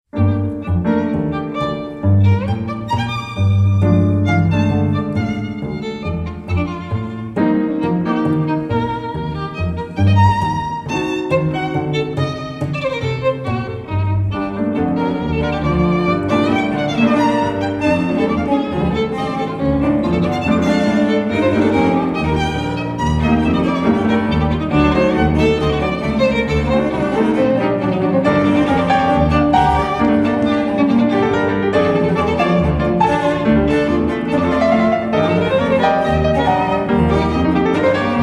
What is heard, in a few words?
Piano Trio; Monument